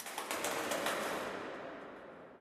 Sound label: amb wing flap, birds; source engine; portal2